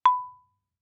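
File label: Marimba yarn fortissimo B5; MIS Pitches - 2014; Percussion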